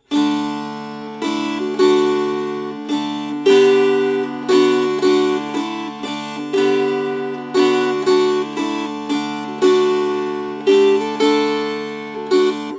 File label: Strummed